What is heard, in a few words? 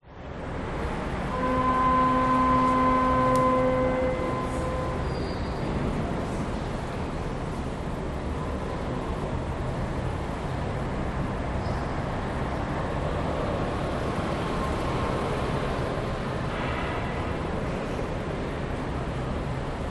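cathedral internal ambience traffic outside people, sydney; public places; cathedral st marys sydney cbd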